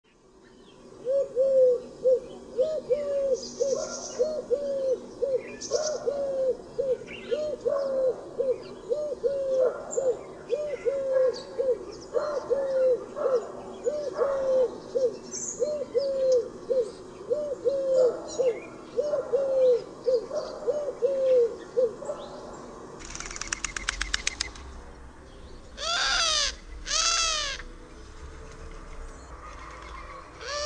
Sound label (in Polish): sierpowka, birdsounds